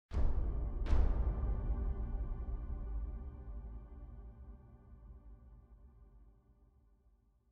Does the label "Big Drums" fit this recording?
Yes